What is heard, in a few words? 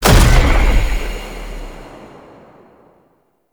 Unhallowed Impact; Warframe; Dagath